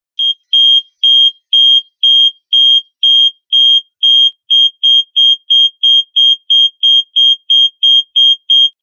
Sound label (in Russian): Трещание